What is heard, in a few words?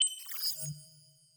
subtle appear; soundmorph